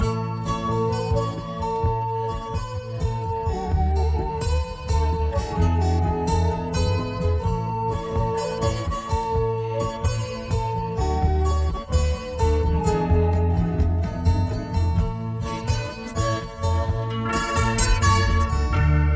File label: guitar